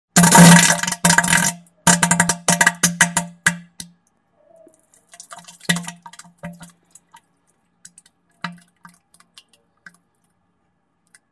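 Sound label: sounds of diarrhea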